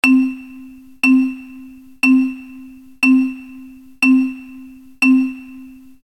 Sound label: marimba; Filter with time-varying frequency bands and Q